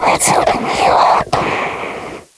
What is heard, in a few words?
Stalker Roam